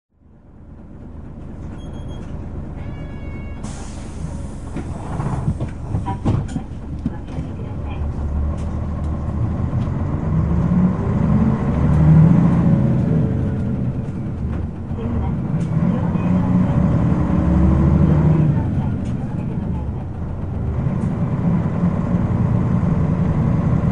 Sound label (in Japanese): 走行音, 三菱 U-MP618M, 宮城交通へ譲渡後のサウンドです。; 仙台市交通局; 長命ヶ丘五丁目→長命ヶ丘一丁目